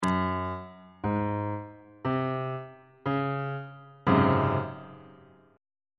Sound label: c sharp major 7th suspended 4th 1st inversion; bass clef